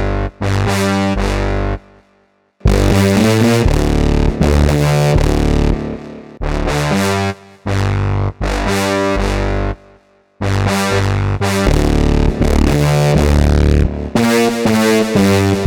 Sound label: brass